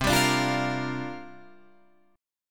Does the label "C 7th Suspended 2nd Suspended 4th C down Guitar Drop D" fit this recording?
Yes